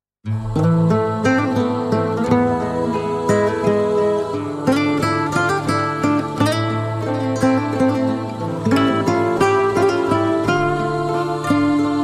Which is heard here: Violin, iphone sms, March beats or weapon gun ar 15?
Violin